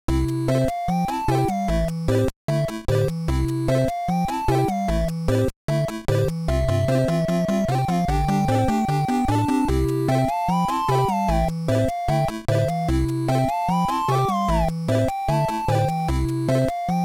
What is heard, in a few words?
arps